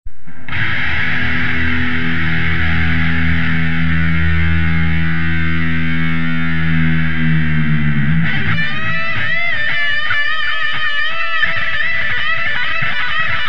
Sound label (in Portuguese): improvisei um solo em